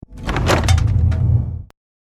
door; fallout 4

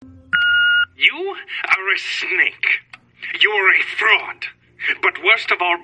call; before your eyes

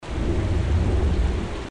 water trap

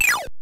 exhale; kirby s dream land

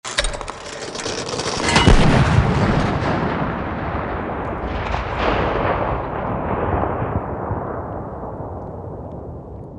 echo large outdoor area; it takes two

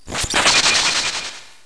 ice; final fantasy vii

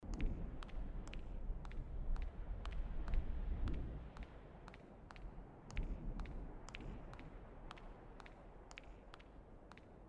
walk cycle concrete; gris

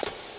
step; Metal Gear Solid; gamesoundopedia

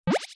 jump; harvest moon

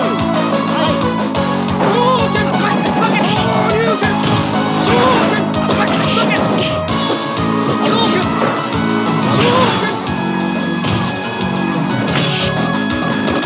stage ambiance; Super Street Fighter II

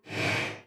karte zoom in; magic 2014

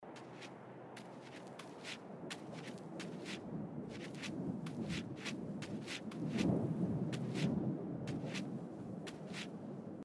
jump; gris

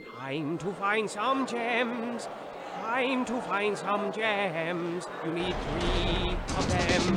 jam; battleblock theater

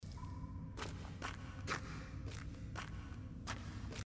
walk cycle ice; gris

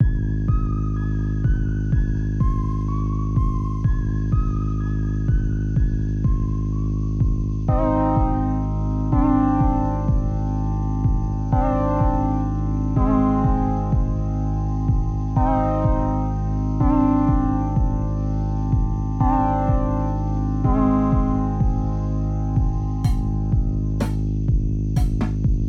factory; baba is you

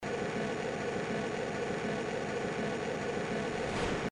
speed powerup active

